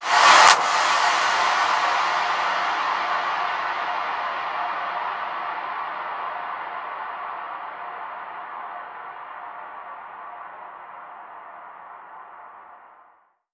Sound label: FX Downlifter